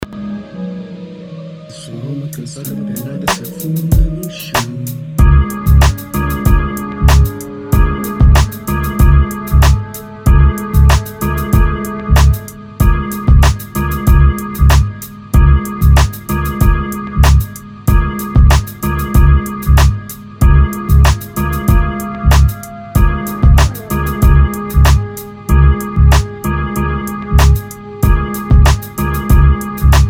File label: instrumental